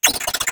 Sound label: drone chirp c4